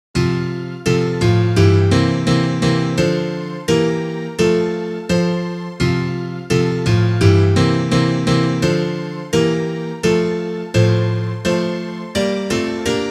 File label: instrumental